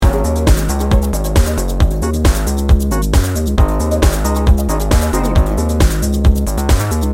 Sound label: fashion technology loop